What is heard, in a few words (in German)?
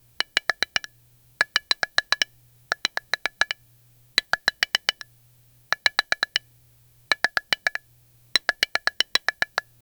eine baustein konstruktio, lego